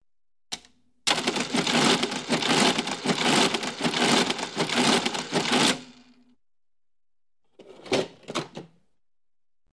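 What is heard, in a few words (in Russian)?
печать итогового чека на кассовом аппарате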